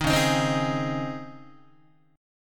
C sharp Major 7th Suspended 2nd, Guitar; C#M7sus2 strummed; 6,9,6,10,7,7; JGuitar

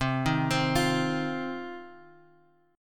C Minor Double Flat 5th, Guitar, Open G; down; chord shape

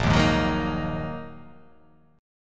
A sharp 7th Suspended 4th Sharp 5th down

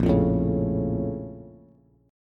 Bb Minor Major 7th, Bass; BbmM7; strummed; JGuitar

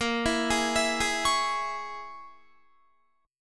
Bb Minor 7th Flat 5th, Guitar; Bbm7b5 arpeggiated

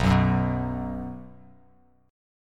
B 5th B down Bass Standard; strummed; JGuitar

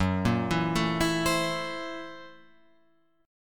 Gb 7th Sharp 9th Flat 5th Gb Guitar Open F; arpeggiated; Gb7#9b5; JGuitar

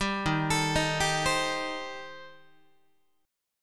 D sharp 6th Flat 5th, D sharp, down, Guitar

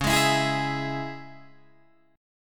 C sharp Minor C sharp Guitar DADGAD, chord; 11,11,14,13,11,14

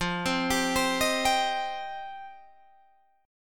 F 7th Suspended 2nd F Guitar DADGAD; F7sus2; arpeggiated; 15,15,17,17,18,17

